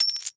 coin flip; goldentavern

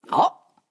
reaction oo; goldentavern

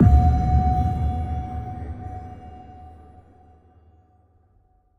win small win; rise of maya

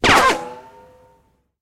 ricochet; dead or alive 2

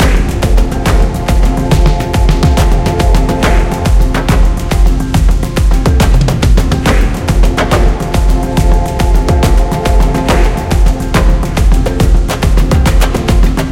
big Win Loop; gonzos gold

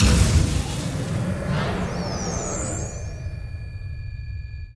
cloner Start; spacewars

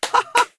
clap; irish pot luck

